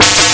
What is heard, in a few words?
laser gun; operation